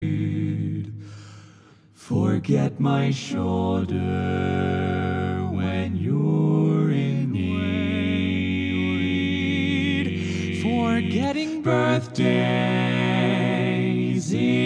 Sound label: Bass